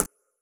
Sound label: small rock impact